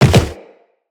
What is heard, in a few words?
Land Soft Wood, Footsteps; Characters; Operator